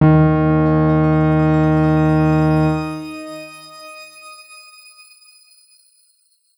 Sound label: X Grain D#2 mf; healing soundscapes; Ableton